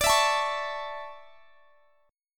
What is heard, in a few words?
D Major 7th Suspended 2nd; DM7sus2 strummed